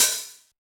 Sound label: T9 HH 1 Open 2, Yamaha DJX-2B